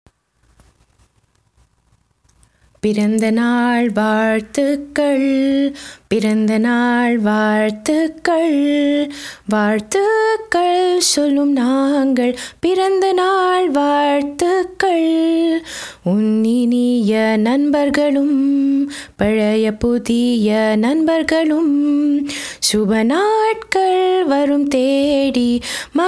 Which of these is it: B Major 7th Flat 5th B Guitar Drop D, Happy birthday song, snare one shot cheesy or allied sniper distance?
Happy birthday song